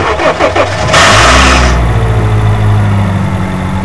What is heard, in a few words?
starter grind, starter noise; 08 fit; root cause starter grind identified; 1st generation gd 01-08